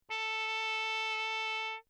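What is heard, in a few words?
trumpet mute; Bach; Alessi; Perinétventiltrompete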